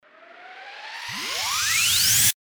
RISER

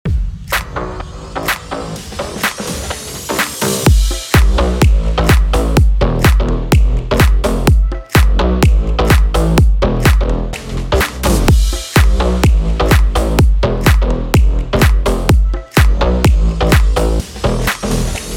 FUTURE HOUSE AMIN 126BPM; BED

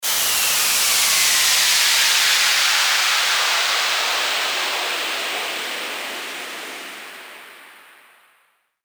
WHOOSH

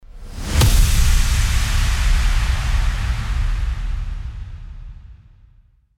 IMPACT

